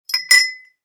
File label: Put down the stirrer